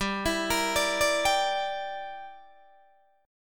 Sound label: G Minor 6th, Guitar Open D